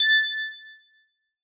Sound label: ding; pyrogue; Oryx; interface